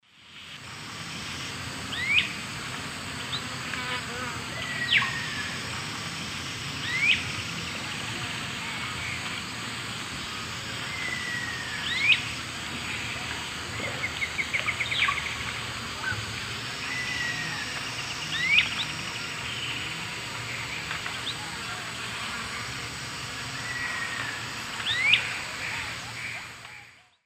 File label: Myiarchus crinitus, calls; S. America; Eastern Lowlands